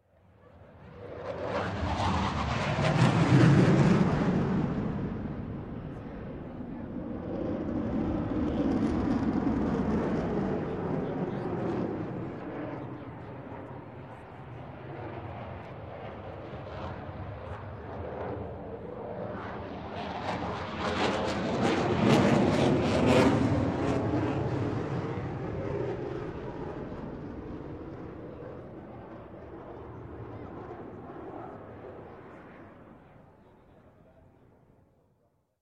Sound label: CF-18 Hornet Pass Overhead; Sound Ideas; Series 2000